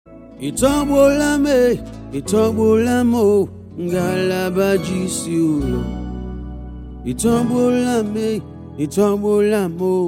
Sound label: Accoustic